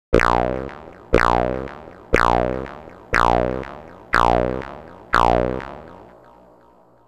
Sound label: gate 16 steps robots; the meditation soundset